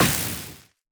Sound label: aether weak; action; impact